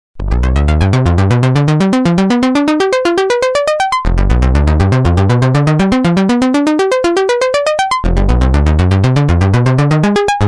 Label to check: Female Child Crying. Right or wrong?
Wrong